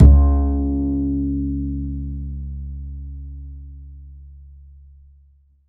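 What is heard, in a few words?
double bass C#2; Univers Sons; Jazzistic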